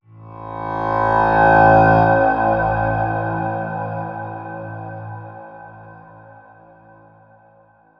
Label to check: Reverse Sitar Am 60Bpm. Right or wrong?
Right